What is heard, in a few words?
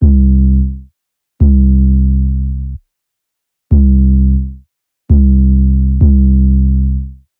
bass; LANDR Medium